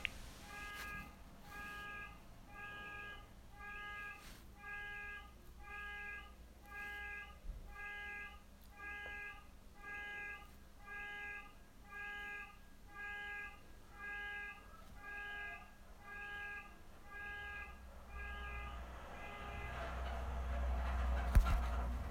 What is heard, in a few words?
car alarm